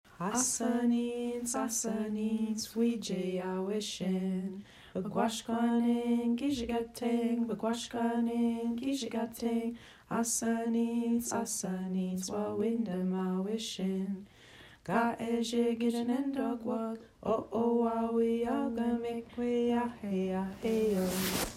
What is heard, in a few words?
Song, Ojibwe